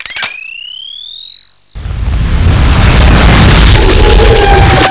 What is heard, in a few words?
gluongun charge; svencoop; custom weapons